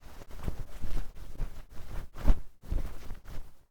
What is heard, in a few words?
laundry spread